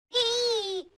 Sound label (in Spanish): grito felicidad; anime